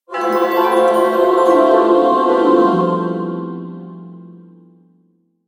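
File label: character appearance; zvuki novogo goda; audio effekty dlya prazdnika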